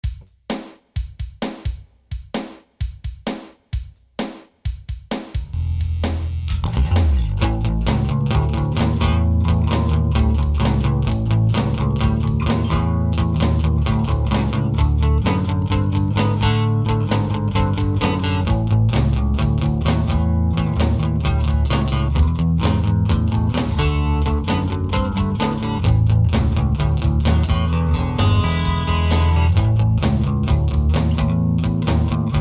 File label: ad backing rock